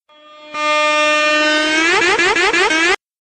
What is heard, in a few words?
airhorn, reverse